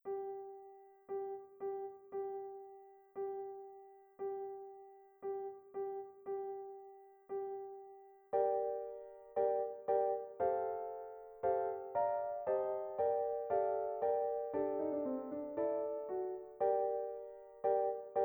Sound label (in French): piano solo; variations sur lhymne de la monarchie francaise